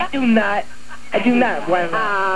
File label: whine; I DO NOT!! I DO NOT WHINE!!